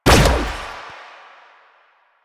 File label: Fire Shot; Duviri; Incarnon Adapters; Incarnon Sicarus Prime; Weapons